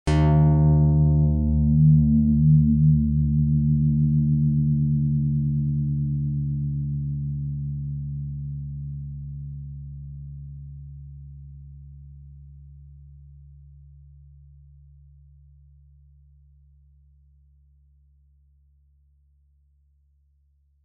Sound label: lyre test